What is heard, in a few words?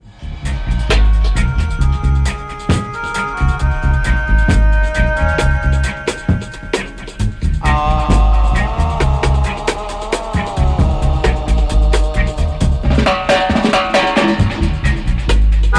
Melodica